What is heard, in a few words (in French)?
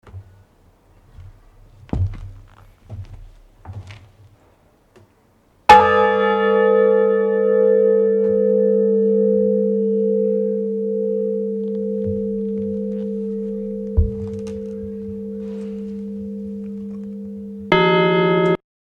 cloche; Toufflers